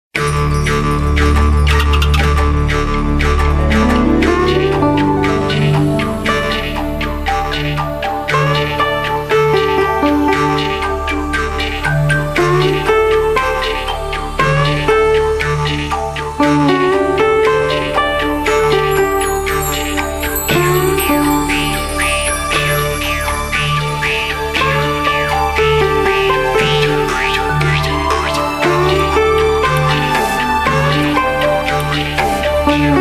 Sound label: Bamboo String; Windows Phone apps